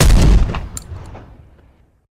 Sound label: m3k fire; weapons